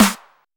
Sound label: Claps & Snares